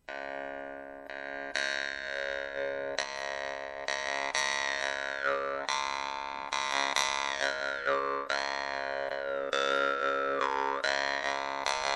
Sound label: russian vargan